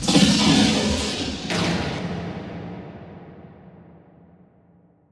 SCHUSS; Best Service; Advanced Mega Effects

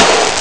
klong; Amiga Soundtracker Sample Packs

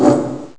rumms; Amiga Soundtracker Sample Packs

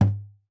KLOPF, GUITAR FX; Xsample VOL-2

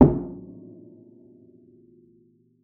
KLOPFEN; Xsample VOL-7

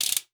RATSCHE; Xsample VOL-9

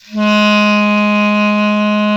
KLARINET A#1, CLARINET

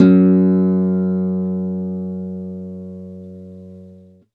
KONZERTGIT, Alpine Volksmusik

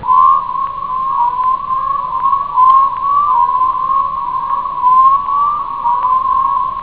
zirpen; wrath; Amiga Soundtracker Sample Packs

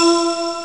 floete; ST-XX Amiga Soundtracker Sample Packs

